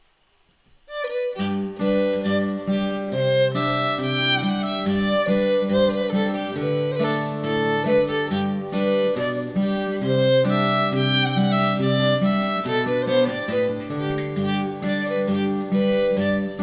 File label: Wards Brae playalong